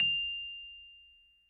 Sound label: F7, Rhodes Mark II; mkII 88 key LO; CEPCo Sample Series Vol. 3